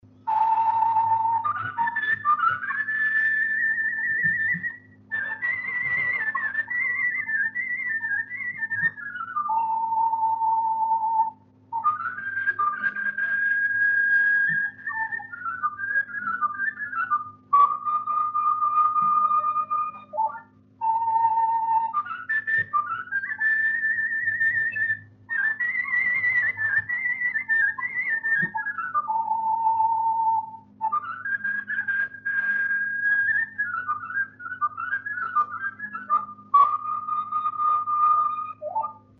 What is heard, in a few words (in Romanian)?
Doina fluier; Vaslui